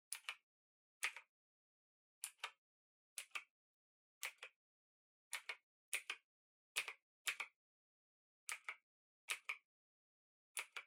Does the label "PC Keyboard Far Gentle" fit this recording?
Yes